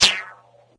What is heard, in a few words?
rubber band sling shot; video games; roblox 2007